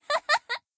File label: laugh, kid, male; PhoenixCraft-1.16.5; PhoenixNPCPack; minecraft